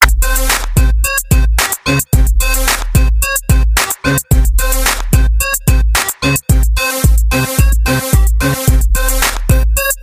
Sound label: Bg Hip-hop